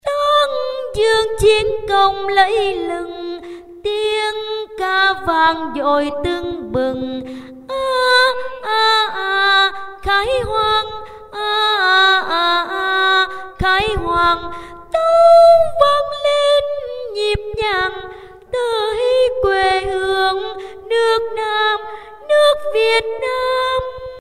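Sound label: tenor high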